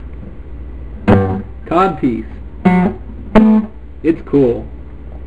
The Cod Song; Codpiece™ 1.0

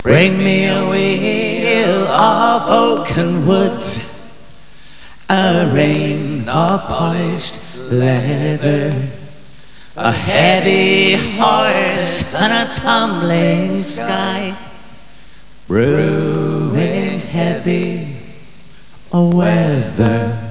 WINDOWS START; BigMax nº 9

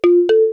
balafon; CPLUSCLAIR

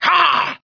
attack, monsters plague Elf; HereticII Demo

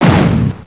hit; acdemo; AC Demo 3-14-98 RipCord

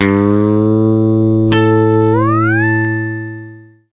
tune

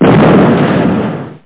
bang; C-C-Alpha

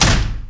door metal close stop; mechanics; Spearhead Demo; MacFormat 2003 June

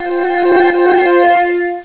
bomb

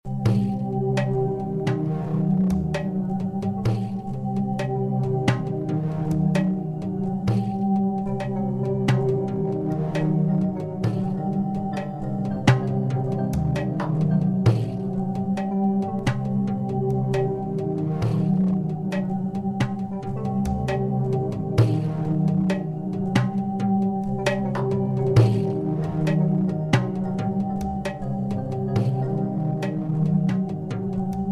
intergalactic acid cave 32bpm

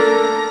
Acoustic Hit

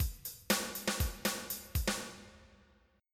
rock, drum

